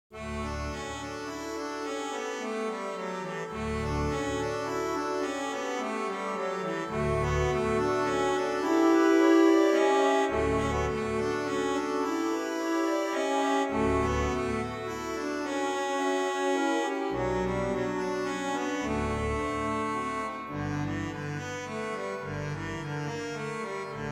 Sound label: Allegretto D, Reverb, harmoniums; P Posthumes; Franck